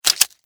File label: Insect, Claw, Hard, Snap, Movement, Bug; Animals:Insects